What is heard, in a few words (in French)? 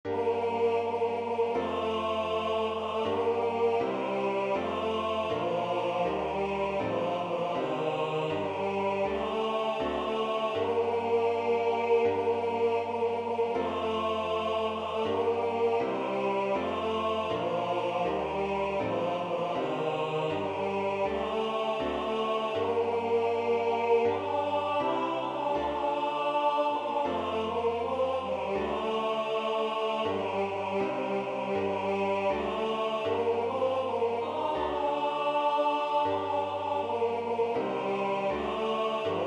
Qui veut chasser une migraine tenor